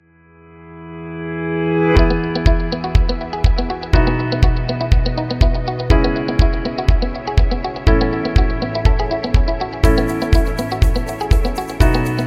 Discount, Corporate background music

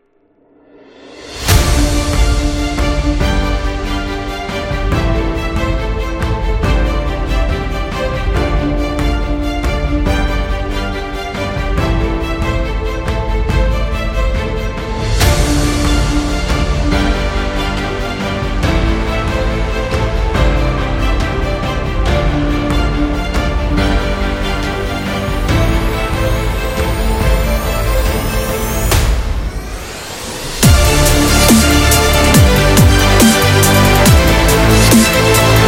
Epic Dubstep

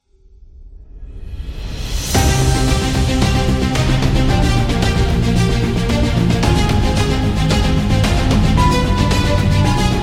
Dubstep Epic; documentary

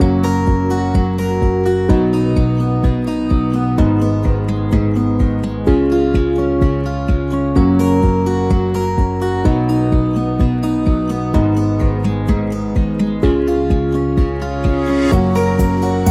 Upbeat Guitar

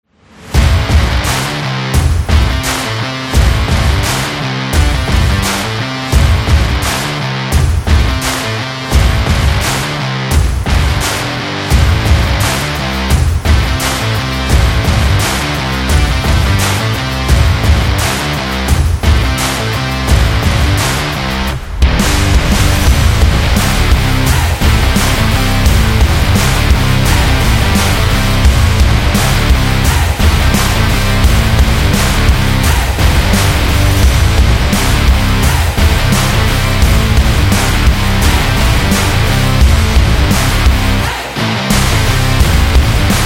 Rock Action

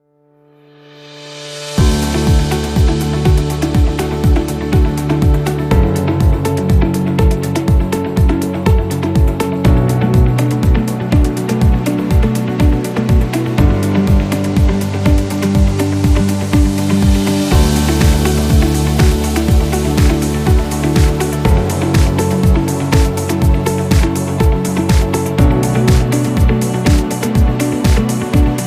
Tech Startup

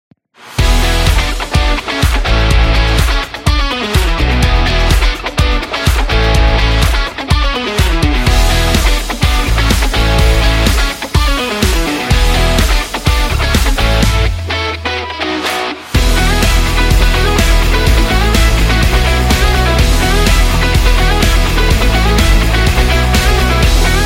Upbeat Indie Rock

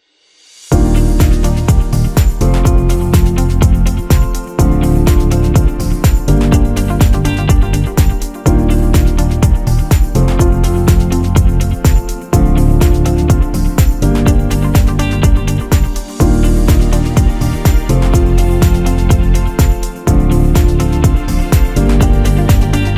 Fashion Beat

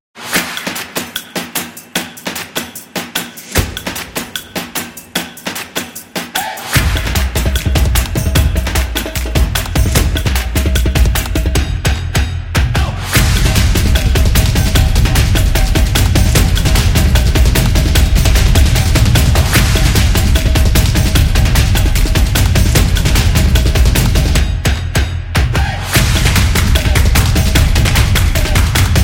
Race Drums

